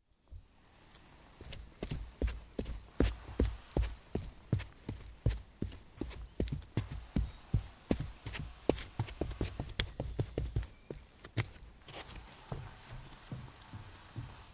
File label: Foley